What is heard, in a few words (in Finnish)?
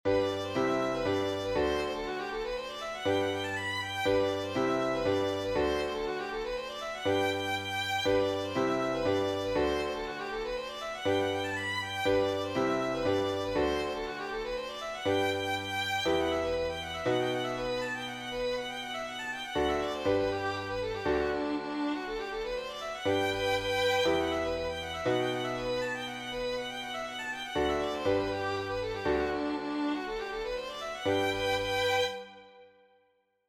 sottiisi